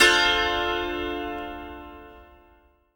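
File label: ZIMBAL PIZZI; Best Service; World Colours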